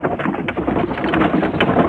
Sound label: Pirate Ship Catapult Crank; Zelda